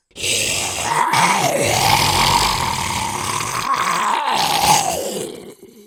zombie nasty

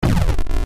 classic push rock; spelunky classic

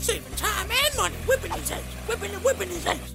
whipping these eggs

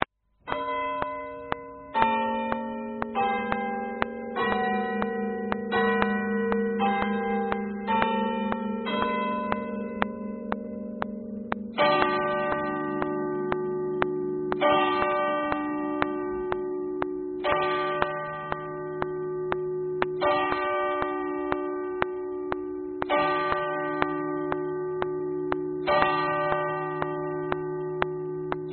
clock chimes

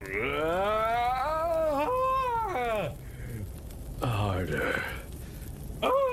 aaaaaaaaaaaaahhhhhhhhhhhhhhhhh harder

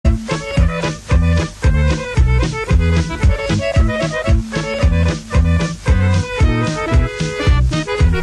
serbian music

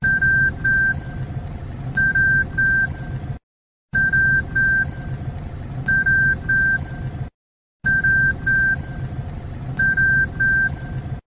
proximity alarm; siege

